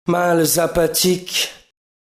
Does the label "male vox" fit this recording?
Yes